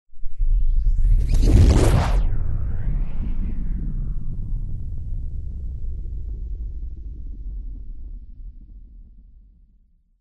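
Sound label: sounds of comet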